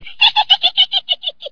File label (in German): hexe, Lachtyp